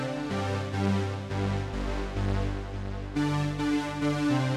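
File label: Hip Hop Orchestra Synth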